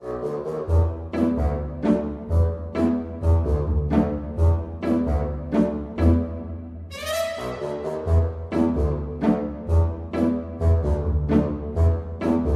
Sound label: bgm